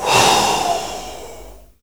WHISPER OO; Spectrasonics; Heart of Africa 1; WHISPERS

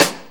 Rim Shot; Sonic Foundry (Sony Creative Software); Drum Tools

